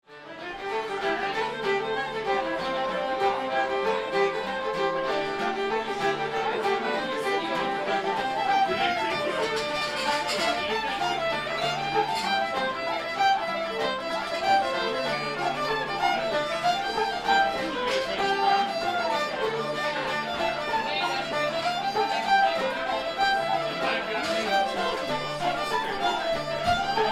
Irish pub ambience crowd and music

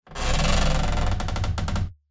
Earthquake wood textures forced bending

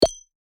Casual mobile game menu ui select

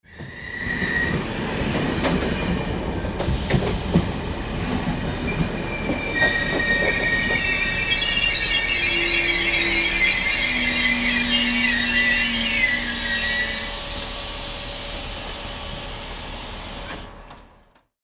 Train approaching station decelerating arrival; vehicle

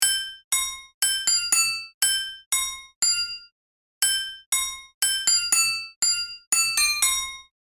Crotales upbeat melody; drums and percussion

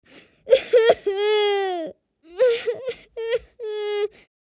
Little boy crying

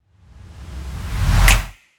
Cinematic rise and snap; logo animation

